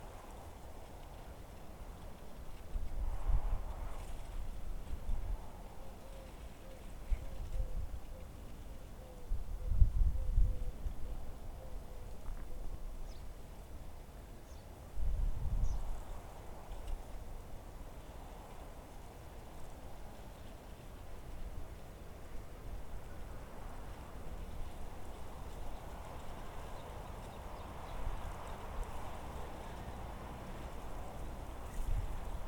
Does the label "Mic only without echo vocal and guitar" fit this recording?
No